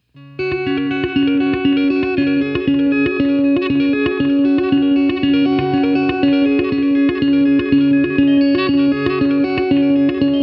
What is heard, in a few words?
tap